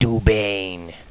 SPELL; sound effect

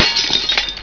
SMASHED, breken

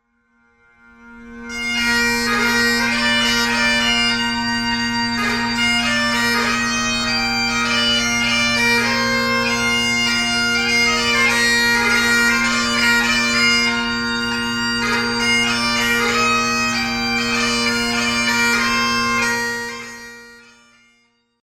bagpipe selection, holophonic music; Holophonic Music and Sound Effects

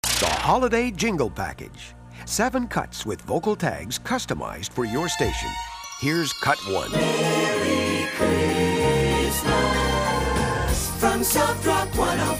Holidays Jingles; TM Century; Radio jingles

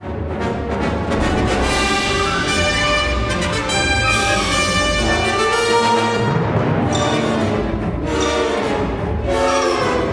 droid loop

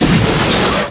crash metal short

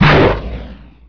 sfx antigravity exit

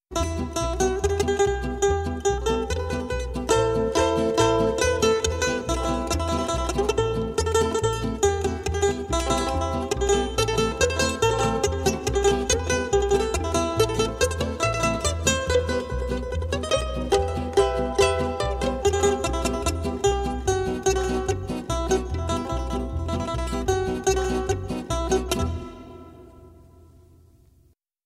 Russian Folk Dance; Media Music Ltd; Short Cuts From Around The World

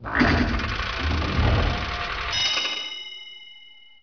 coin roll

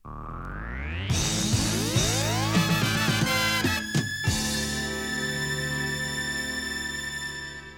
topformat maxi jingle single

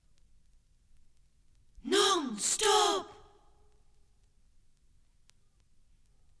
topformat maxi jingle single

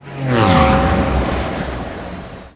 pod wizby

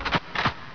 RIFLE

